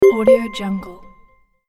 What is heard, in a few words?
mobile text or menu notification